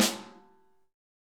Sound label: SN AMBIENT; Best Service; Real Mega Drums VOL-1